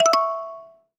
task end

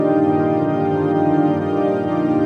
pad

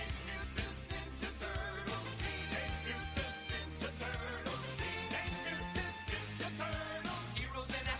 theme; tmnt

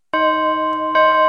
error

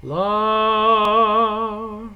sing; csound-manual-react

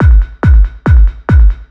Loop

